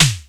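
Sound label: snare, electronic drums; Crypton - DrumBase5000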